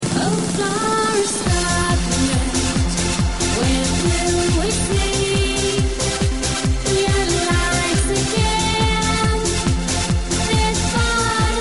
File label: Scottish Clubland, club ringtones